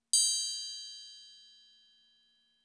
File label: Triangle